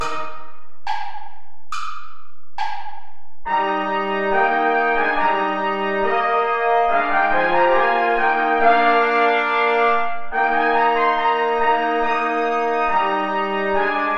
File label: play along without trombone; haendel georg friedrich; thine the glory